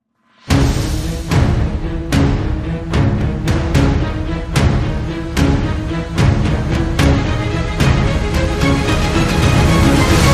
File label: Dramatic Sting